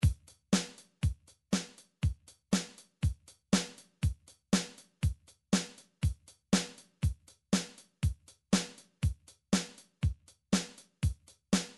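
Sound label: midi drums, simple 8th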